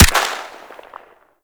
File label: Shot A; Tenno; Dex Furis